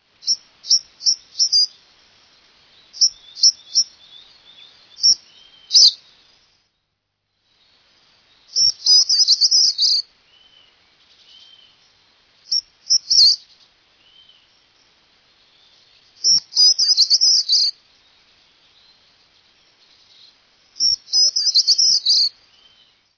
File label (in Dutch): boomkruiper